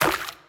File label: puddle